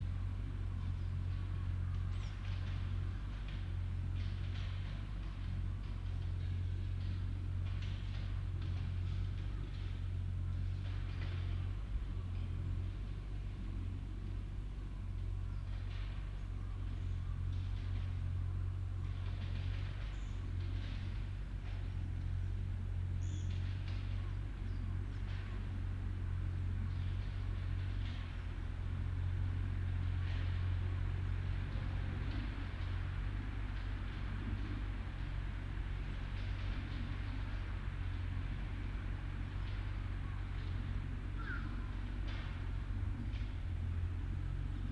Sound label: yamanakako sound